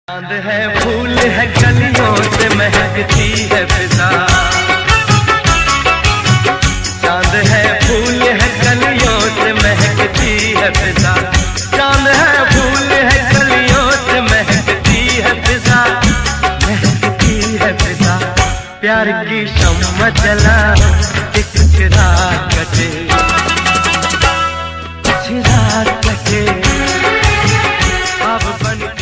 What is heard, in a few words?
90s song ringtone, hindi ringtone, old hindi ringtone